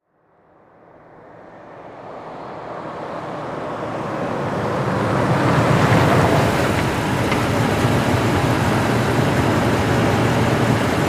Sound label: Expedition Medium Id, ford expedition